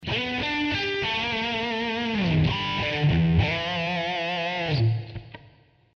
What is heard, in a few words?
arpeggio, guitar; guitar arpeggios part 1